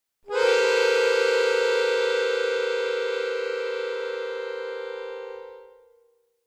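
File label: Accordion Keys Tension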